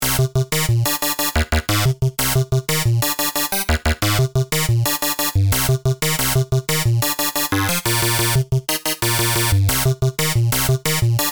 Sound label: reset repeat, sequencer; By the end of this example, the only active modification to sequencer playback is Random Jump; Numerology; Five12